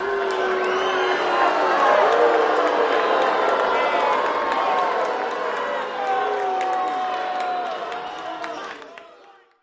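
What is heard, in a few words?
Crowd Boos During Fight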